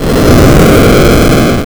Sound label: death; timber